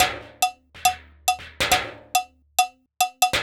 Tins Topline (140BPM), household rhythm samples Loops